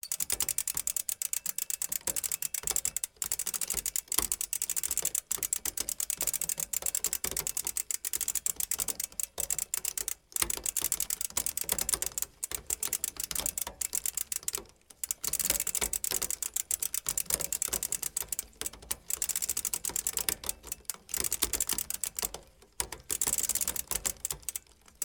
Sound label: Bicycles, Wheels, Chains, Crankset, Broken Bike; lilesound